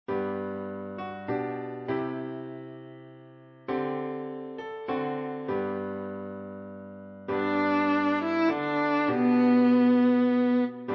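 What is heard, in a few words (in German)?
Bratsche; Stille Nacht heilige Nacht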